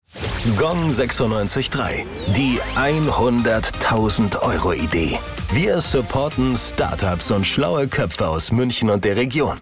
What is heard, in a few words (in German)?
Gong; supportet Start-ups